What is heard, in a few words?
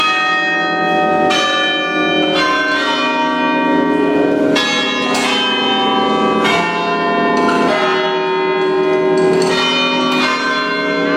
bells joy to the world